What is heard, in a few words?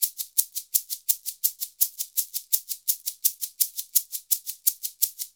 SHK, Shaker; Univers Sons; Basicussions